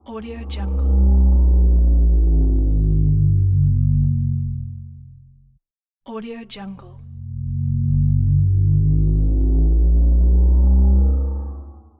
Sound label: shield power on and off